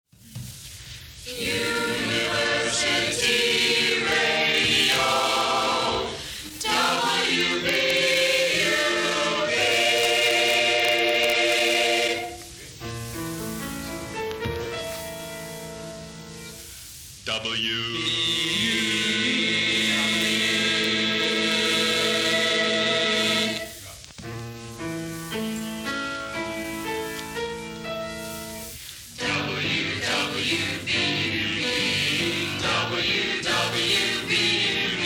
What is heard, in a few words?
Jingle Sings; WVUB